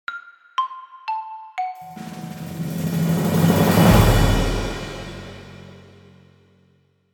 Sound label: perc